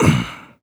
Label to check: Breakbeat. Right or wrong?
Wrong